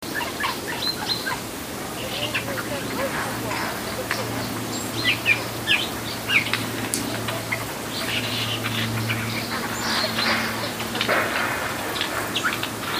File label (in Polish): sojki; szczebiot sójek